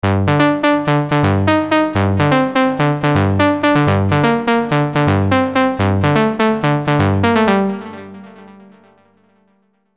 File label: Organism, Gm, 125bpm, Melodies